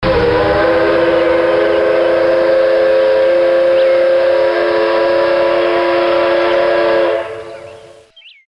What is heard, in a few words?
D51 long